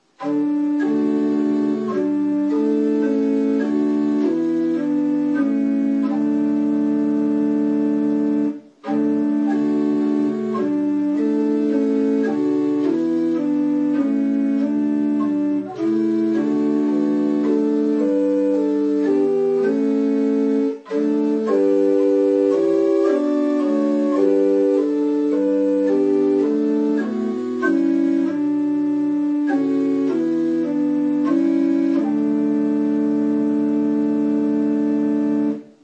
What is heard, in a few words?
Hymn We Walk By Faith